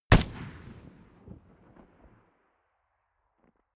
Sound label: rifle ak-47 exterior fire single distant; comedy-cartoon; Pistols and guns; pistolen-gewehre